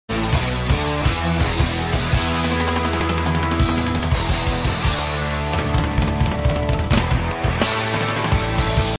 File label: drum solo ringtone